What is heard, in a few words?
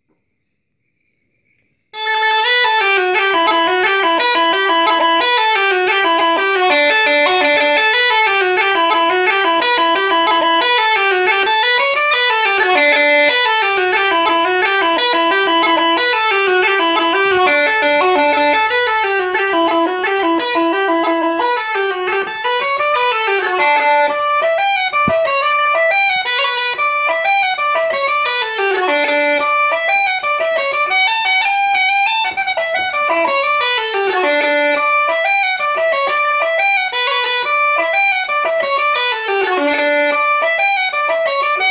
chanter